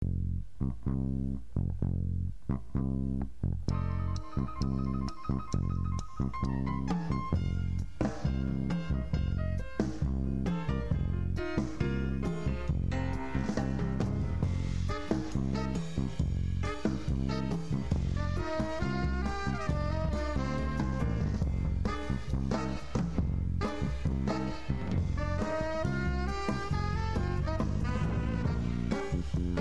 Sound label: Funky, jazz band